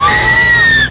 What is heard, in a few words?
scream